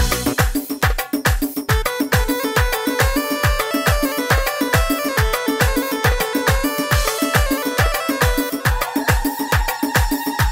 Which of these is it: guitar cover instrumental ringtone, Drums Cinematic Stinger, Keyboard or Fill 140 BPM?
Keyboard